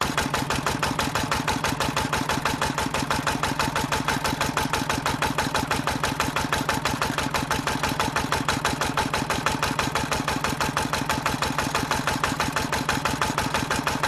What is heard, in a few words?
slow diesel pump motor loop; the sound pack tree